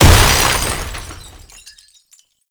Vial Hazard Explosion No Liquid; Warframe; Entrati; Hazards

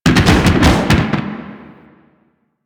Motorcycle Slide Boost Backfire; Vehicles; Warframe; Motorcycle Ninety Nine

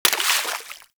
Bullet Impact Debris Water; Warframe; Weapons; Bullet Impacts

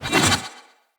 One Handed Axe Elite Spin Whoosh; Weapons; Enemy; Axes; Sinecure One Handed Axe Elite

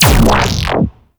Capital Ship Laser Fire; Warframe; Corpus

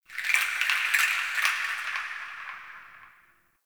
Wood Chimes; Warframe; Nokko Colony; Ambience

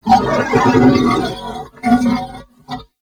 Horse Vocal Screech Stopping; Vehicles; Warframe; Duviri

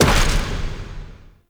Detach Land; Inner Depths; Warframe; Sentient Devourer; Sentient Spawn Outdoors; Cinematics

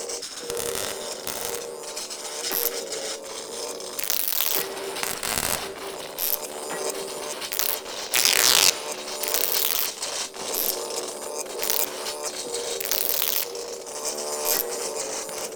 Electric Sparks Prop Loop; Warframe; Ambience; Entrati

